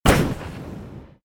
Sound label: explosion